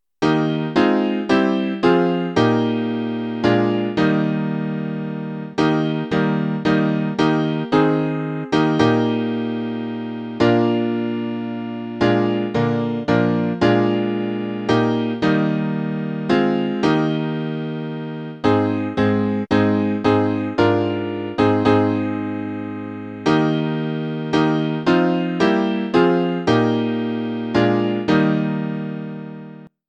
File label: Whisper A Prayer piano